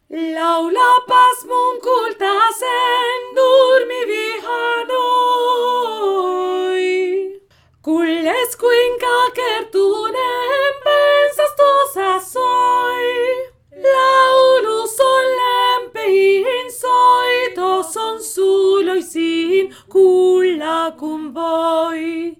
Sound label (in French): VOIX AIGUE